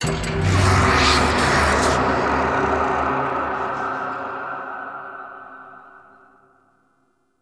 Dead End Secret Puzzle Success Hit; Ambience; Gameplay; Warframe; Entrati

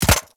Micro Land; Warframe; Corpus; Arachnoid Micro; Steps

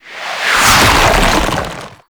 Sand Spawn; Warframe; ManInTheWall

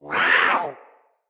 Feral Vocal Attack Short; Catbrow

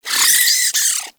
Vocal Death, Hunting Critters; Nexifera

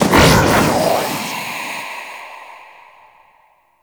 Minion Summon; Warframe; Stalker Acolyte; Area Caster Acolyte

